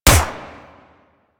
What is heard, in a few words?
Harpoon Gun Shot; Warframe; Sharkwing; Grineer